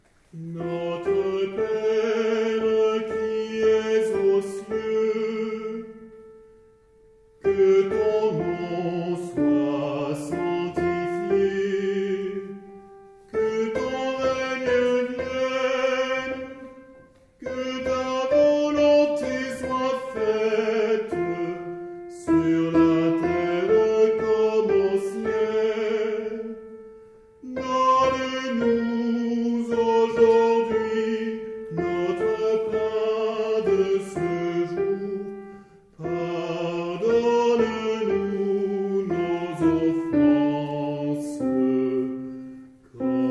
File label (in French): Notre Père Soprani